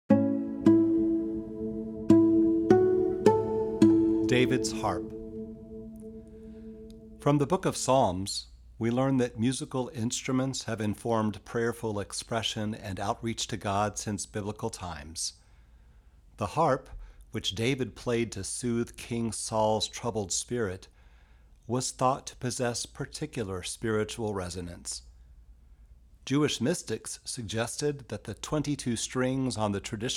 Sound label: Harp; Davids Harp; lehrman rabbinic garden; audio narrative